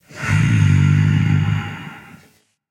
thinking; chaac

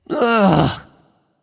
"ugh"; smite; voicepack; janus

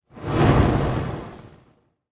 lobby victory burn; smite; god daji skin15

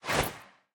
swish small; smite; god artemis

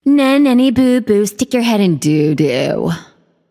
tongue; smite; freya

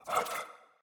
zombie vox; smite; ahpuch skin10

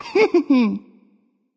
hehheh; smite; voicepack; thanatos

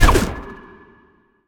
Bow Fire; smite; god cupid skin13

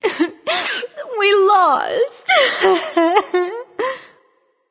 boo hoo; daji

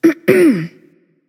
clearthroat; charybdis

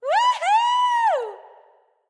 yay; smite; nox

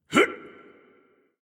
hitreact human male small

